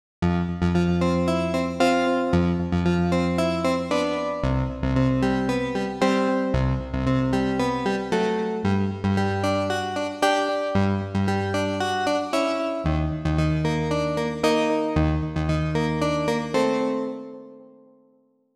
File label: EP reflections, Roland JU-06 DigiD pianos; SoundDiviner Project; Roland JU-06 Pack05